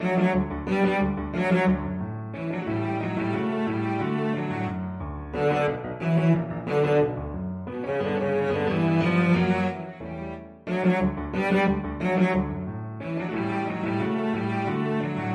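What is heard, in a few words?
violoncello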